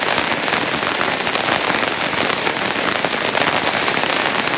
aerobatics smoke loop

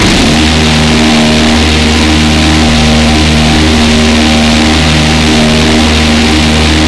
Automatic YakB, Weapons; DCS

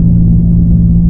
hipcutter mid loop

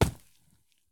soil sprint; Escape from Tarkov

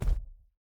bear footstep concrete hard; Rust

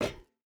footstep rubber boots metal run; Rust

